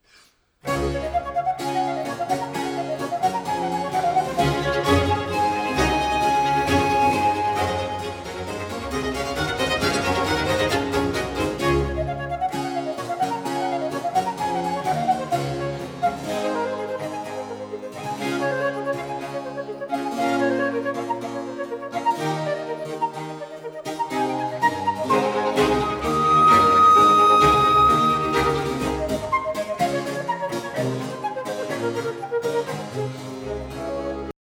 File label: Flute Concerto in D Major Allegro; TWV Anh. 51 D